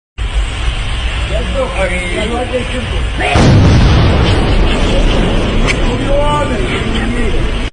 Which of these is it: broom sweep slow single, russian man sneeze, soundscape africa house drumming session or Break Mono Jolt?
russian man sneeze